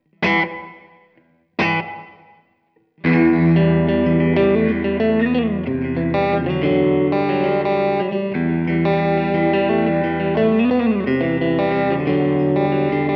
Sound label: Studio Reverb; Harley Benton Dual Effect Duality